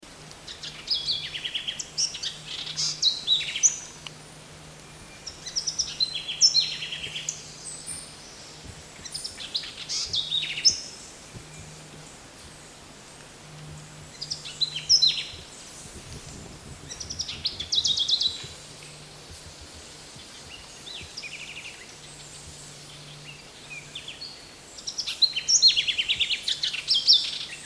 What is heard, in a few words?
Wren Sound